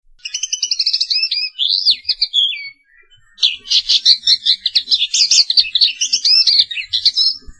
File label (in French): linotte